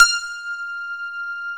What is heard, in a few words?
piano F 5; AKAI S-Series CD-ROM Sound Library VOL-8